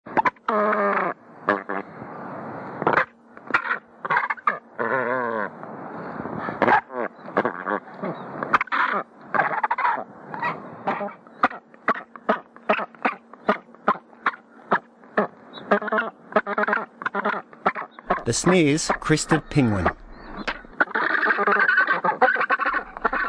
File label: snares crested penguin